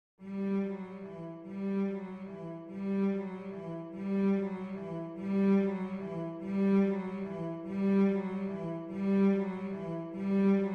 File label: carols of the bells; leontovich mykola